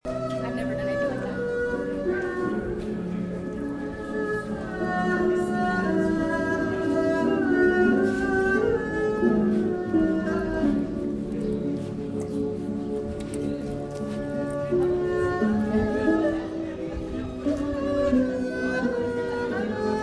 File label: Chinese string instrument